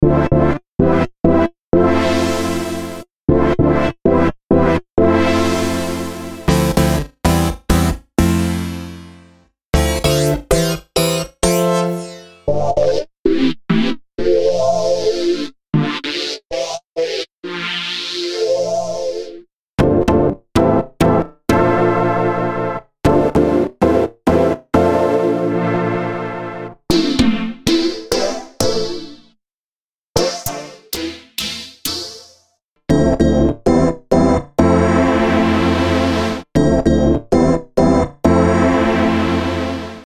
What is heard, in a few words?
House Chord Stabs; Tassman4; Electronic Toolkit